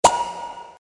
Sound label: short ambient impact event